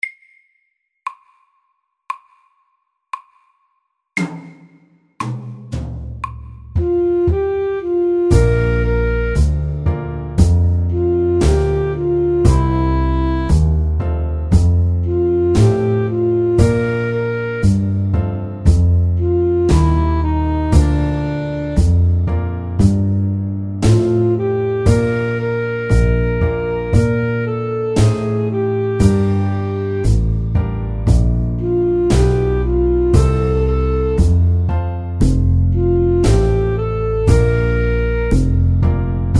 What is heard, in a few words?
Slow blues sakte